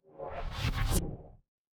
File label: magic scifi teleport